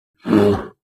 Bear, Grizzly Roar. Single Short Roar. Close Perspective.; bears